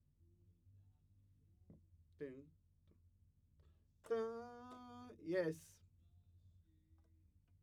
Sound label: Trumpet